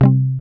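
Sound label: GUI C, acoustic guitar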